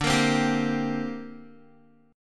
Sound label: D 7th Suspended 4th Sharp 5th down Guitar; D7sus4#5; strummed